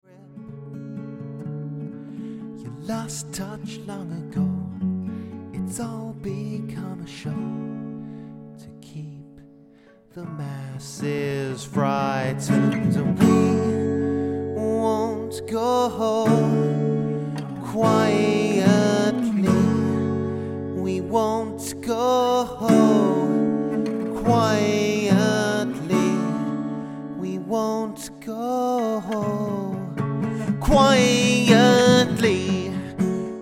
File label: healthy acoustic